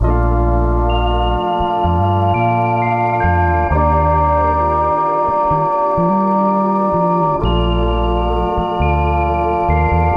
Melodic Loop Full A maj 65Bpm

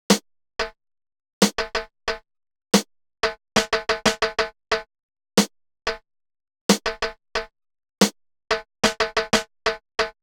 Timbales 91Bpm; Bellaco

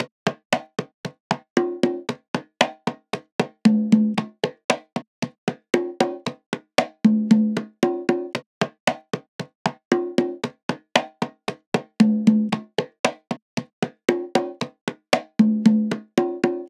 115 Congas Chacha

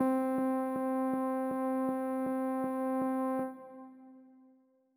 Synth

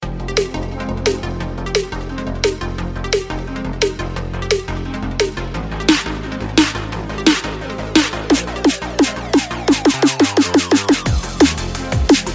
Drum and Bass Snares

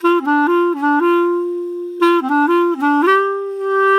120 G Joyful Clarinet Solo

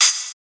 Hi-Hats F

